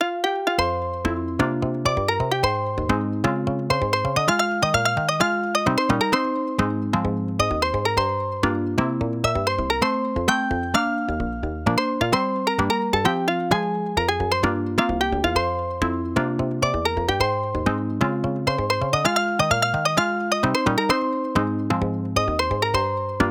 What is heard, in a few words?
string pluck